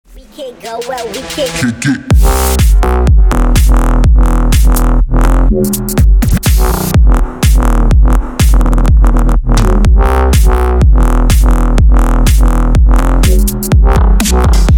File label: bass house